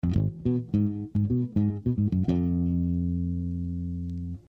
Bass

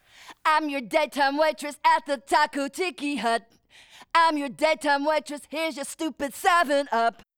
Vocals

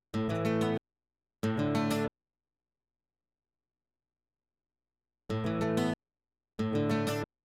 Guitar

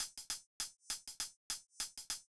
clicks and hi hat; Multitrack

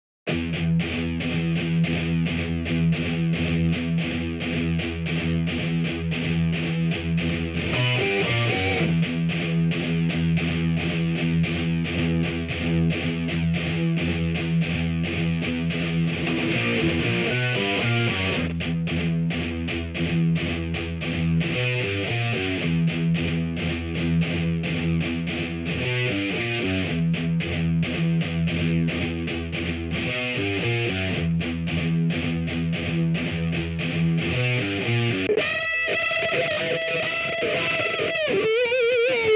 guitar

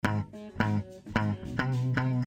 Clean Guitar

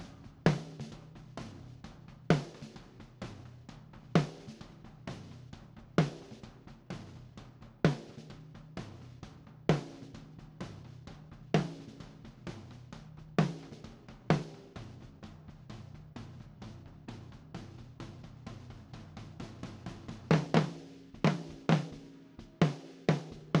Drums - Snare Verse through Chorus

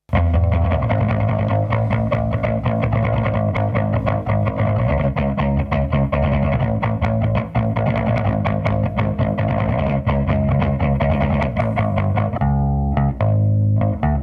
rhythm

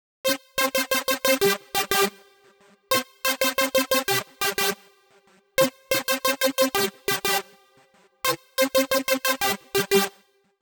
synth

